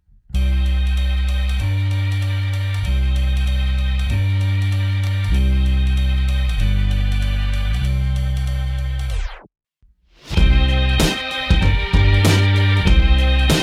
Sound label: Instrumental